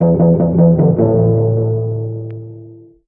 bass, Loops Phonky

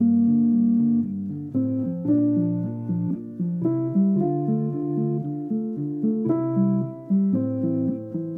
keys, Loops, Sad

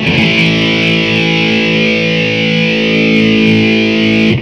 hard distortion, Guitar; Notes

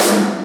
snare, electro

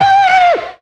"ooh", voice

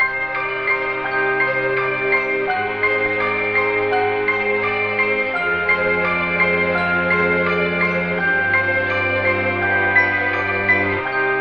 strings, Sad

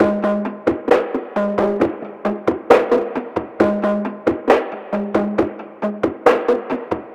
bonks, Drum loops

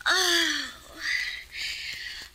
female orgasm

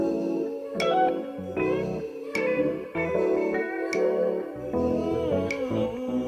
guitar, Loops, Sad

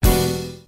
smooth jazz

